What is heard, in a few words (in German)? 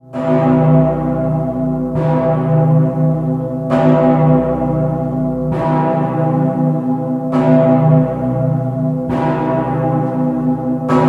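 Kölner Dom St. Petersglocke; koelner dom klingelton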